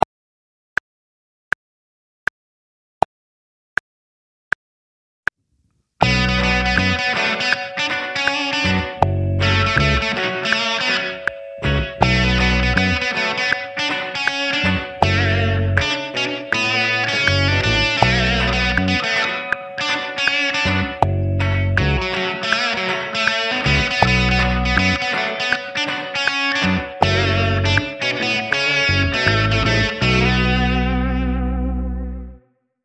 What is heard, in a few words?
8 Bar Reggae Groove Based